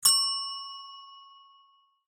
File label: Call Bell Tap Style